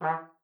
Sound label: E3, TRUMPET; Best Service ProSamples vol.42 - Session Instruments; PS-42 Session Instruments